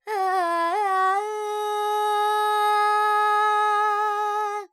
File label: Charukesi, Vocals, Carnatic Female, Alaps, G, Dry; Sample Banks